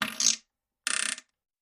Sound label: Bowl Fart Creaky; farts